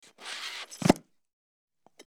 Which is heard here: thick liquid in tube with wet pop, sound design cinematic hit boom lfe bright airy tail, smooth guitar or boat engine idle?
thick liquid in tube with wet pop